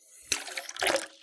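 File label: water tap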